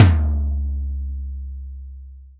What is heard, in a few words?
TOM, Real Drum Kit, FUNK KIT